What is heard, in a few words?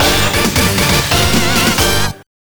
gameover; Eschatos